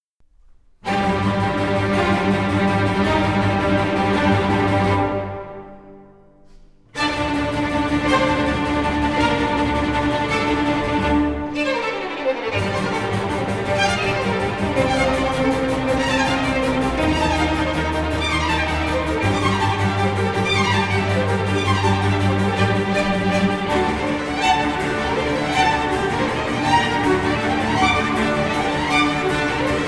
Tempo impettuoso; Sinfonia Varsovia; L'Estate

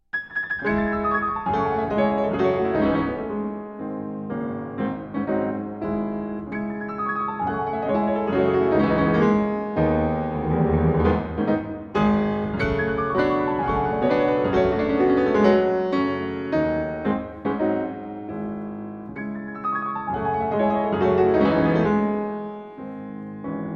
Preludes C Sharp Minor; Op.28 No.10

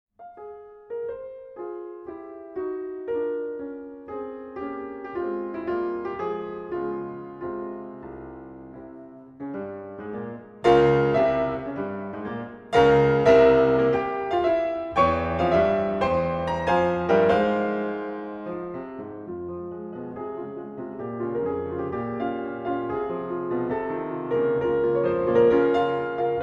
Piano Concerto in F minor Maestoso; Chopin; No.2; Op.21

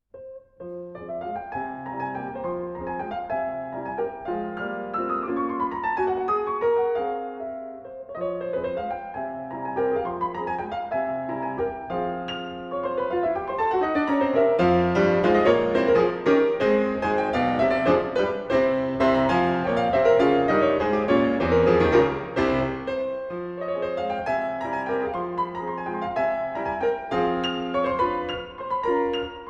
Piano Concerto No.2 in F minor Allegro vivace; Op.21

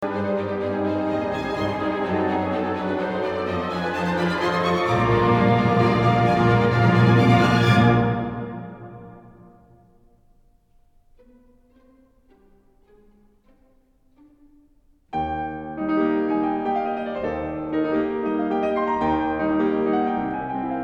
Grande Polonaise in E Flat

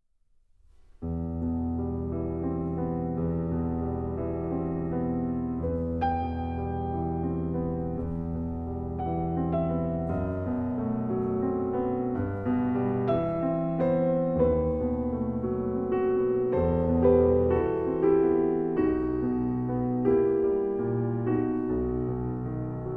Nocturne in E minor

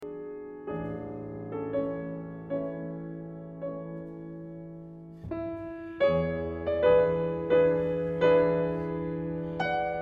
Preludes Op.28 No.7 A Major

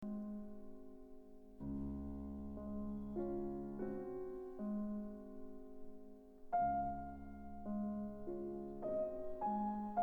Berceuse In D Major; Op. 57

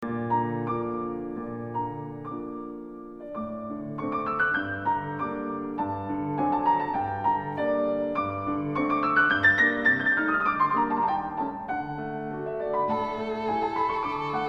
Piano Trio Finale Allegretto

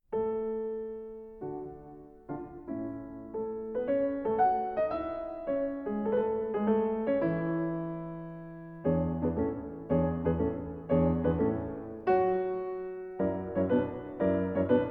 Allegro de Concert Op. 46 A major

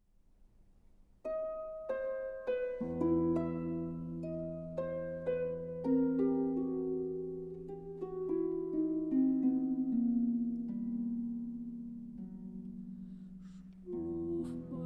Lullaby; Wigenlid

